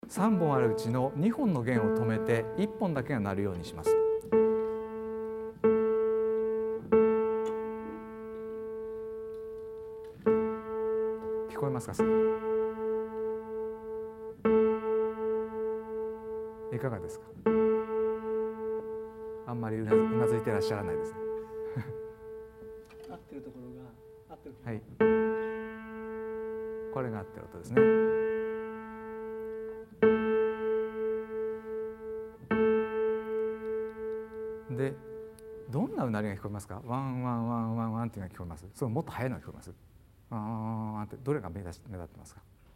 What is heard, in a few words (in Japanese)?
うなりオクターブ